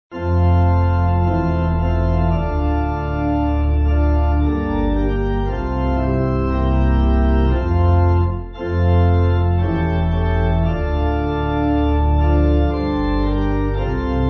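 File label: pipe organ, Music Backing Files for Churches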